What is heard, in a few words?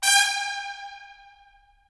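brass trumpets staccato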